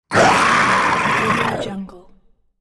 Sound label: attacking roaring zombie